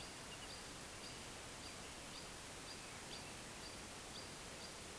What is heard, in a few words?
Ficedula hypoleuca